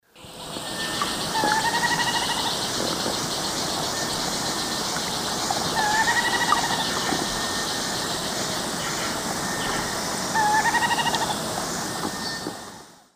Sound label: Piaya minuta, call; S. America; Eastern Lowlands